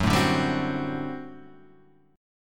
G Major 7th Suspended 2nd Suspended 4th F sharp

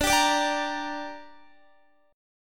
D Suspended 4th Sharp 5th D down Guitar, chord

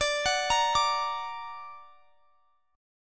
D Augmented D down; D+ arpeggiated

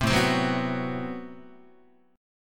A Minor 6th Add 9th Guitar, chord; Am6add9 strummed; 3,5,3,4,3,5; JGuitar

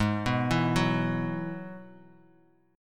Ab Minor 7th Sharp 5th Ab, down, Bass Standard; arpeggiated; JGuitar

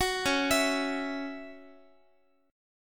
C sharp Minor Double Flat 5th, Guitar; arpeggiated; 4A,4E,5A,5D,5G#,6C#; 21,21,19,x,x,x

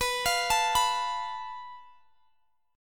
F Major Flat 5th B

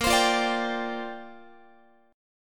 Bb 6th Add 9th Bb Guitar; Bb6add9 strummed

